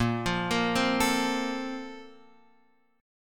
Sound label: A sharp Major 7th Suspended 2nd; A#M7sus2 Chord {6 8 8 5 X 5} arpeggiated